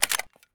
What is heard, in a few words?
fal mag out; Escape from Tarkov